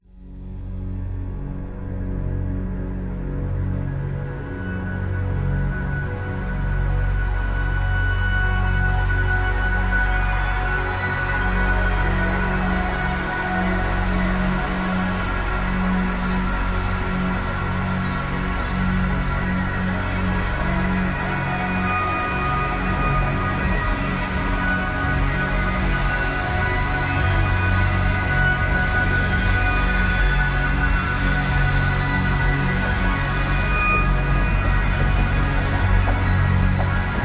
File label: Meditation Zen, Meditation background music with soft ambient pads and peaceful sound textures; meditate